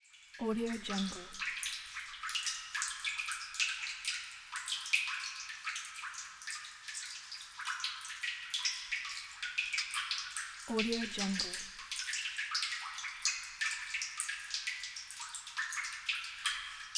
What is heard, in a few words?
sewer